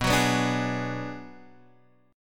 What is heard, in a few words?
B Minor 6th B